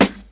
90s raw acoustic snare sample g key, snare drum tuned to the G note; snare drum samples